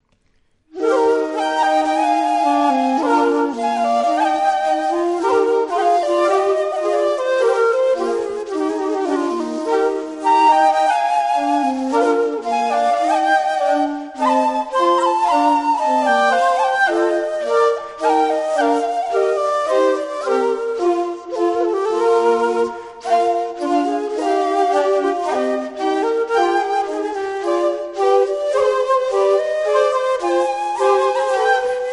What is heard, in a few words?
Gavotte BWV1068